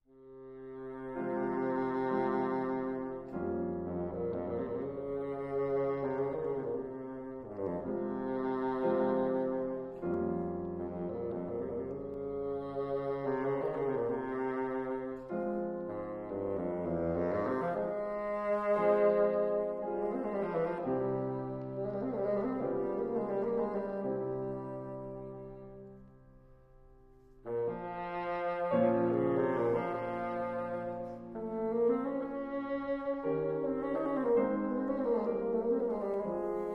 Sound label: Doppler; concert; banff